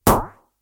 Pump action rifle, single shot; Guns; BBC

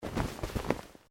Clothing Shirt Cotton Move Quiet; Movemement sounds for various articles of clothing; Dressing

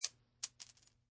Quarter Coin Drop; Clinks

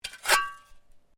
Shovel Scrape; Working with a shovel or spade; Objects

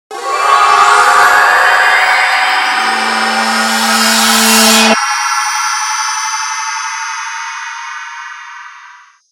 Shimmer Shine Twinkle Warble Delay; High Tone; Production Element Imaging Element Accent Transition; Blastwave

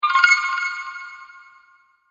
multimedia rollover, Multimedia Rollover Tone

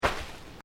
Footsteps Boots Sand Step; Footstep Foley; Footsteps (Sound Effects:Human)

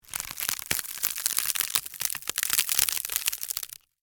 Crunch Basket, Slow, Rip, Tear, Wood; Texture; Crunches; BlastWave

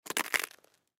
Potato Chip Step On Crunch Junk Food; Household; Foley; Blastwave

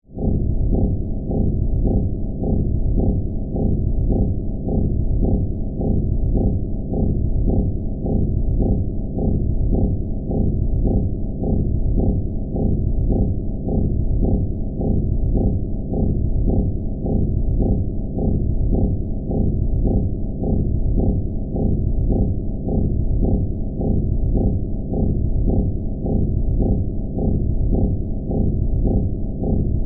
Drone Power Source, Pulses, Scifi; Science, Fiction; BlastWave

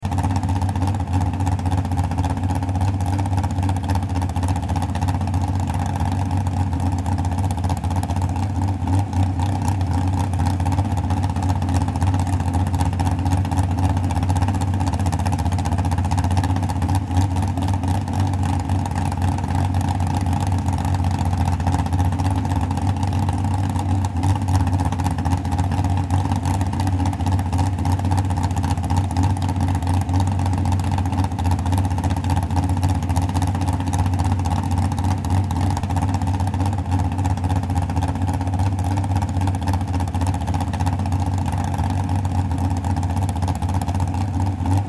Swamp Buggy Idle Loop; Swamp Buggy Engine; Transportation Watercraft

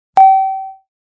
Beep; Beeps; multimedia

